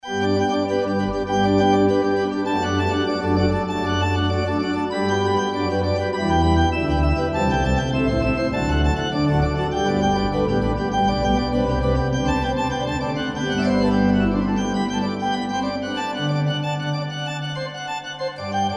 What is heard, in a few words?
concerto in g, orgel